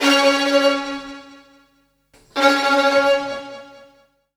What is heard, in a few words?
AR STRGS 1, Roots Funk; USB Soundscan vol.41; AKAI